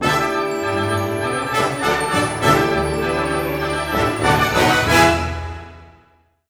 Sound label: HIGH, ORC Highland; East West - Scoring Tools